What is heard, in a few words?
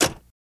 Ambulance Door Close, doors